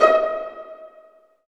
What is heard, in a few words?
STR PIZZ, String Sections, Violins Pizz; Vls Pizz; Roland LCDP13